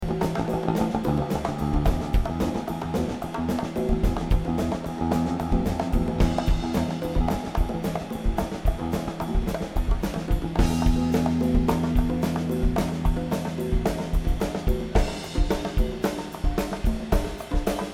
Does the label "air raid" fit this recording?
No